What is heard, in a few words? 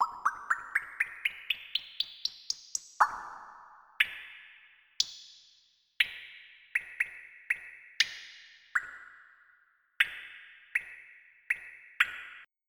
DRIP; croc; AMBI LAVA